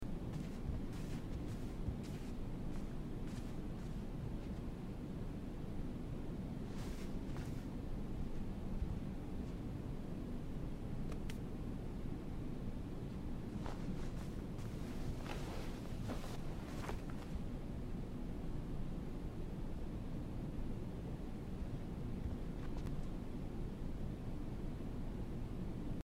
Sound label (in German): entering room hintergrund; Life is Strange 2; Karen's room; gamesoundopedia